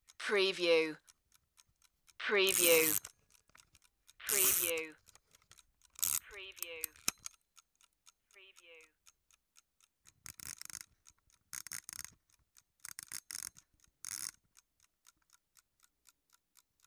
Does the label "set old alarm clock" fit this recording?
Yes